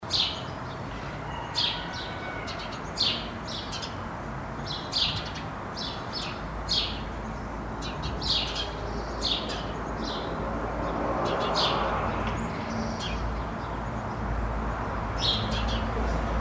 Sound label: Ambient innen